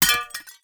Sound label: glass hit; teardown; ps5 windows xbox series xs